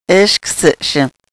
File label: scream